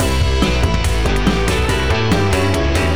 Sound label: bass